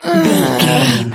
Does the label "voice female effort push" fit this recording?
Yes